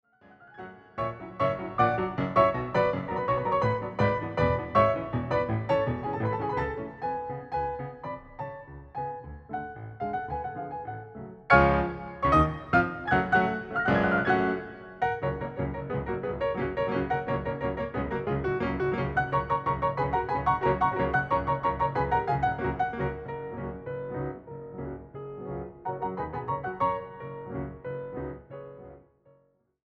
Hungarian Dance in F-Sharp Minor- Allegro – Vivace; brahms; 21 hungarian dances; WoO 1